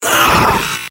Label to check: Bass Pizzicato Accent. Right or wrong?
Wrong